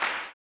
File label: CLAP, Blues Soul; Spectrasonics Vocal Planet